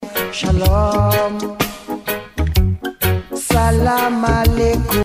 jerusalem reggae

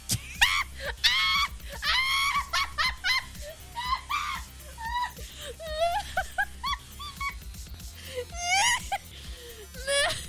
teen cackle

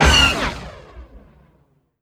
blaster

